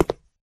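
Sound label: Grunt Movement Footstep Walk Concrete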